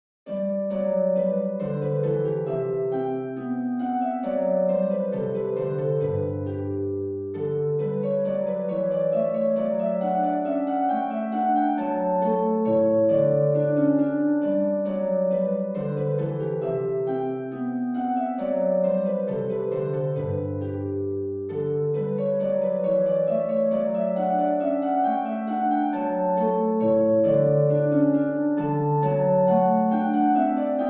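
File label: bourrée, G major; sol majeur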